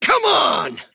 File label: attack pain; davion; dota